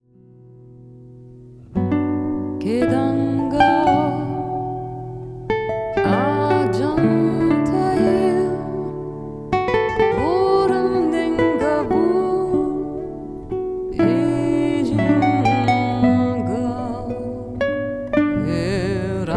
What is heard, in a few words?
wooden melody